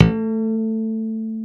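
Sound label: A2 harm, stick bass; D-STIK BASS1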